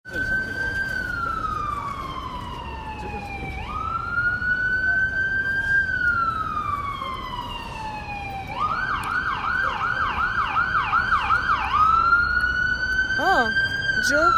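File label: fire alarm on the street in New York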